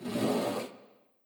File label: window move away